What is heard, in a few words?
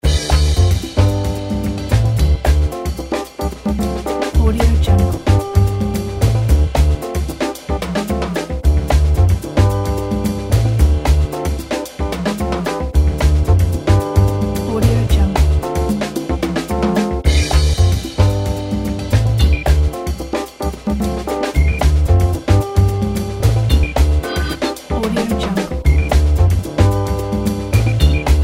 food cooking restaurant swing fusion jazz